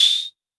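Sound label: CR78 Tambourine; Drumset 04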